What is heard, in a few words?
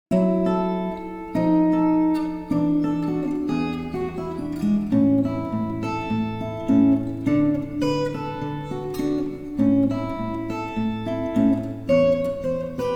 blues en la